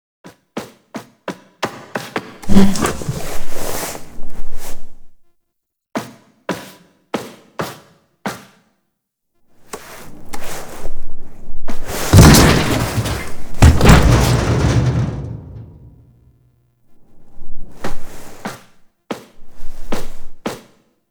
Destroyed Class Barricade Foley; Cinematics; Warframe; TheNewWar; Zariman

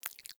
Foley Mouth Move; Enemies; Warframe; Catbrow

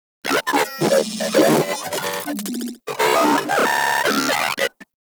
Vocal Idle; Moa; Vocal Set D

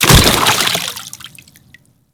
Kubrow Bodyfall Water

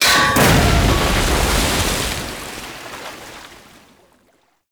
Huge Tank Explode; Effervon; Warframe; Ambience; NineteenNinetyNine

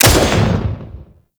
Grenade Launcher Shot; Grineer; Weapons

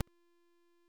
Short Sword Medium Parry Perfect Block Impact

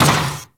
Moa Combined Step Default, Footsteps; Warframe

